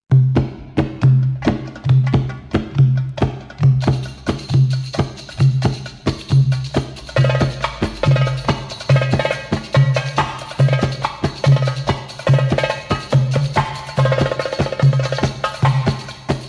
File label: Drum Solo